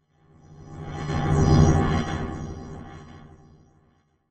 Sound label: Hover Craft By Flang, spaceship fx